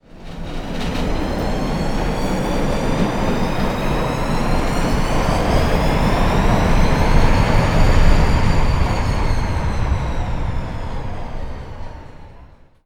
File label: roller coaster